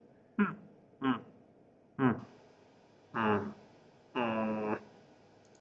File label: mmmmmh